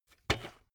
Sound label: bmx bike pedal grip double soft